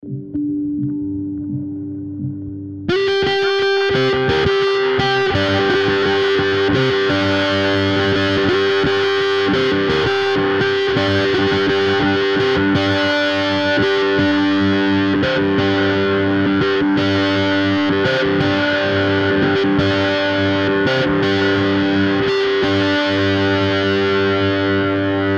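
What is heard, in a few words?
G 170 guitar riff trash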